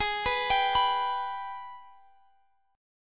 G sharp Minor Major 7th G sharp down; G#mM7 arpeggiated

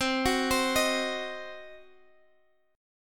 C Diminished, Guitar Half Step Down, chord

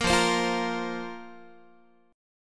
F Suspended 4th F, Guitar; Fsus4; strummed

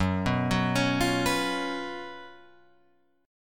F sharp Major 7th Suspended 4th, Guitar, Open F; F#M7sus4 arpeggiated

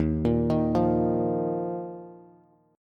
Eb Suspended 2nd, Bass; Ebsus2 arpeggiated; JGuitar

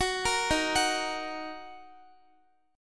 D sharp Minor, Guitar; arpeggiated

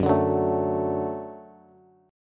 Gb Minor Sharp 5th Gb Bass; strummed; JGuitar

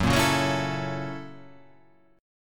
F sharp 7th Sharp 9th

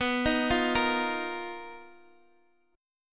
B Major 7th Flat 5th B down, chord shape

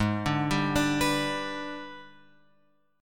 G sharp Minor Major 7th Flat 5th, chord; G#mM7b5 Chord {4 5 5 7 X 7} arpeggiated

